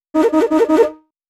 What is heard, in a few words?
Horn Flourish; Cinematic Funk; Sonic Foundry (Sony Creative Software); Cinematic Funk Urban Tone Poems